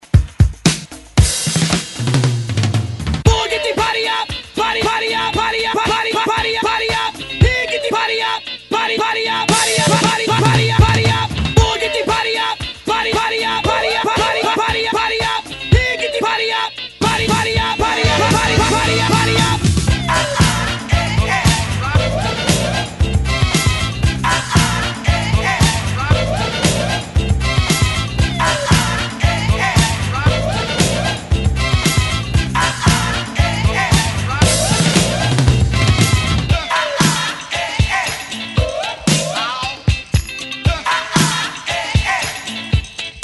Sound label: 70s Hype Re Drum 114Bpm; DJDOLLS